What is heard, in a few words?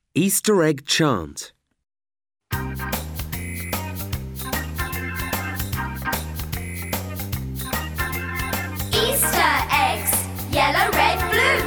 Easter egg chant 5 años